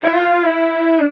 vocal loosen Dm; SD AURORA Sample Pack; Slate

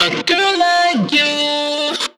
phrase Bb, Vocal

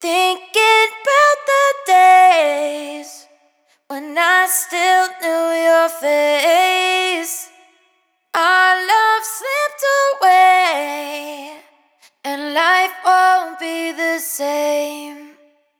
hook Thinking Bout 115 D wet, Vocal; Slate Digital Omnivox Vocal Sample Pack

